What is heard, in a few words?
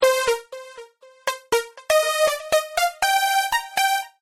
Keytar; saturday morning rpg switch gamerip 2018